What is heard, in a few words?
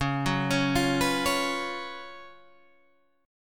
F sharp Major 7th Suspended 4th, C sharp, chord